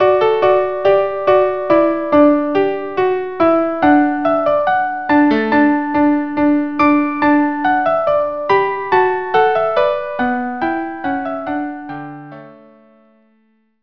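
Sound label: violin viola; richard 1 march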